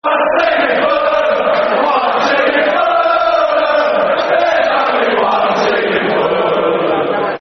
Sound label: There's Only One Team In Fulham, Football Chants (Soccer Song); Chelsea Cfc